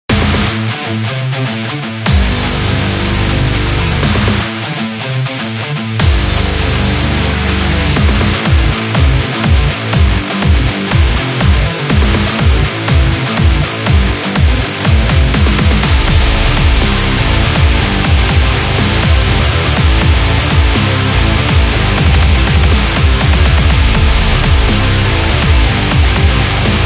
Crime

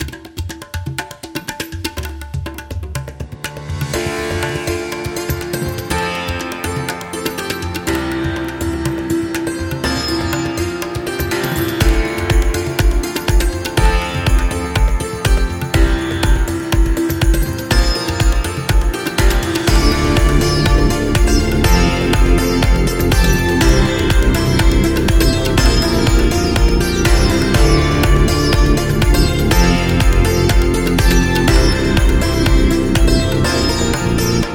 India Music

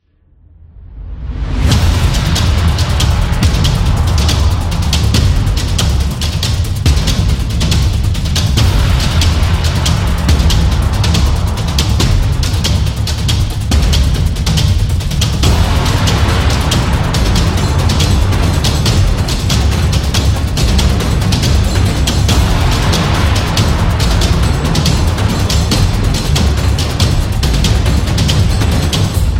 Epic Drums; battle drum

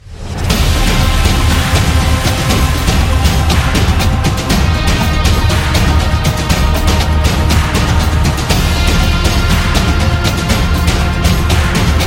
Epic Sport Trailer; action sport

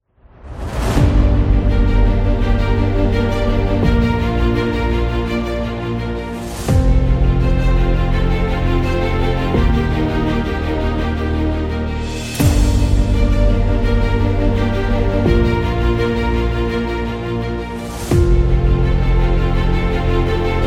Inspiring Cinematic Trailer; documentary

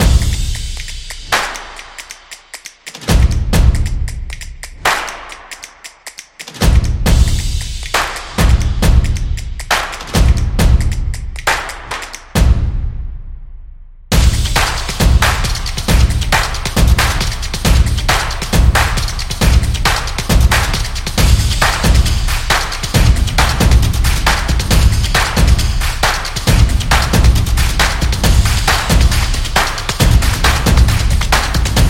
Percussion; high energy

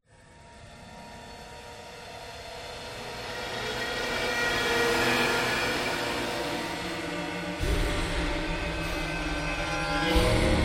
Suffering, Dark horror background music

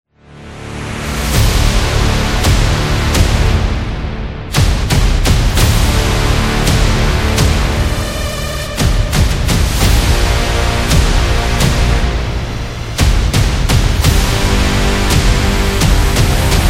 Cinematic Power

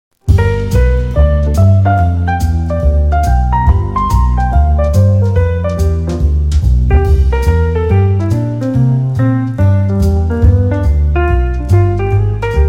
Jazz

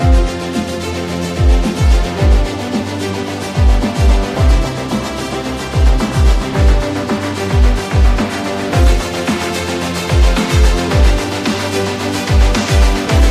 Epic Bass

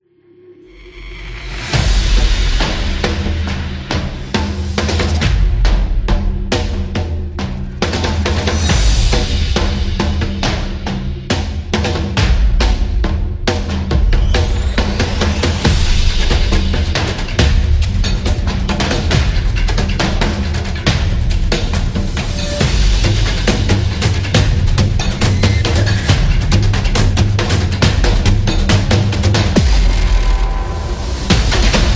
African Documentary

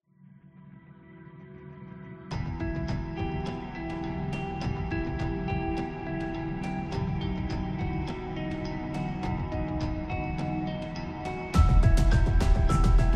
Suspense Trailer

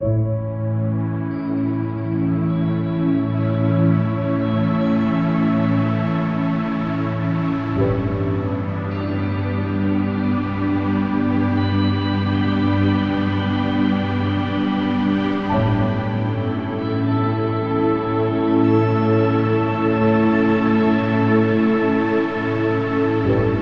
A Meditate, soundscape

